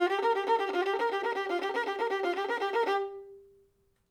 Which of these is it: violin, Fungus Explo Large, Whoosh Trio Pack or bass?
violin